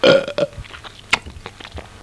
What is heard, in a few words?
wolf; Little Red Riding Hood